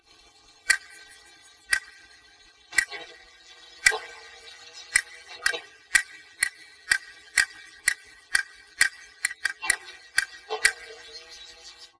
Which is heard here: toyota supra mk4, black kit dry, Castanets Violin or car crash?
Castanets Violin